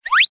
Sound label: cartoon slip trip or fast ascend